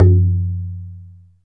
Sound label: Ethnic Perc, Percussion; Dance Synths